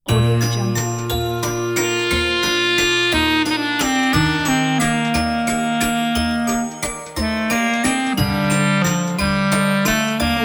nostalgic fantasy clarinet

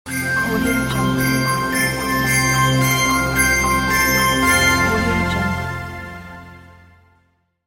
christmas logo ident

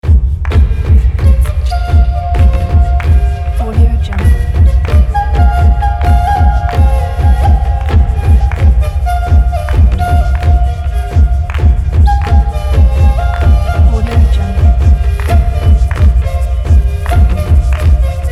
ethno flute and big drums

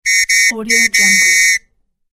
warning whistle several blows

